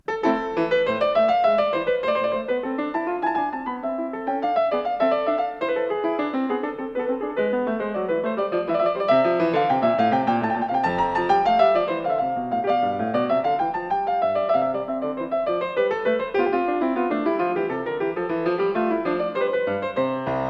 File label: English Suite No.2 In A Minor BWV 807 - 3. Courante